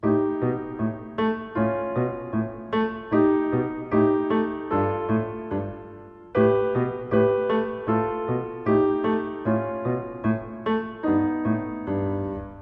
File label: Tracks in Snow